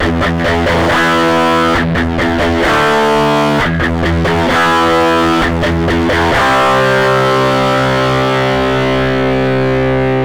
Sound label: DI, amp samples